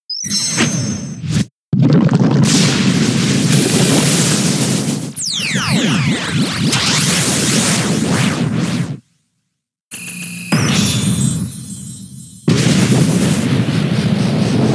Acid Downpour; Pokemon; gen 7; SUMO; USUM

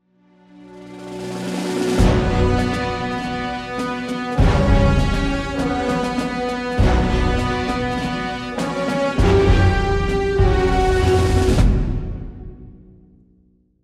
Pushing Forward

